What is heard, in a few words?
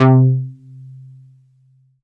Dance Floor Bass, Multisample Basses